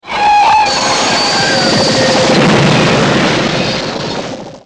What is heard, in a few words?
Howl; Airwolf